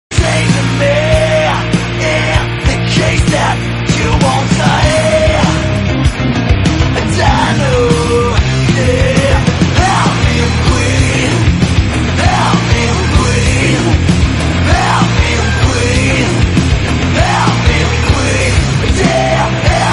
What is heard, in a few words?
samba